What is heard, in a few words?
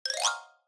angry; AvatarEmojiSticker Beyond